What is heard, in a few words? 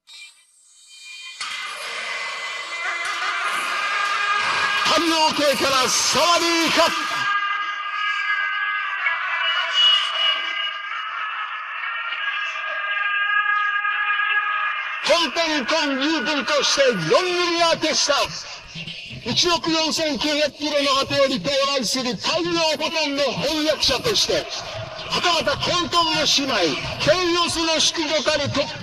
vocals